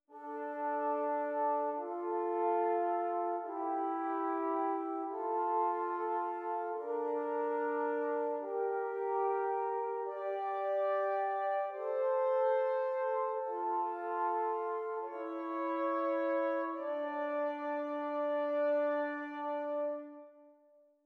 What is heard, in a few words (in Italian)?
Esercizi di contrappunto a due voci; Erste Gattung; Nottebohm pagina 48; corretto